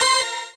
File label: normal hit normal